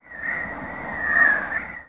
Wind; Playable Tutorial Level

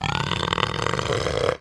Tiger Growl; Playable Tutorial Level; TR3

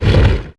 Stone Scrape Fast; Playable Tutorial Level

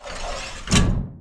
Trapdoor Close; Playable Tutorial Level

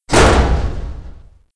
Door Metal Close; Playable Tutorial Level

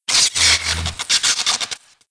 Head Charge; Playable Tutorial Level

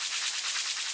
Spring; Playable Tutorial Level

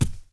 Sphinx Walk; Playable Tutorial Level

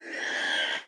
Warthog Squeal; Playable Tutorial Level

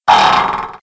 Claw Hangar Door Open; Playable Tutorial Level

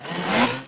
Vehicle Rubberboat Accelerate; Playable Tutorial Level; TR3

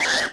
Warthog Headbutt; Playable Tutorial Level

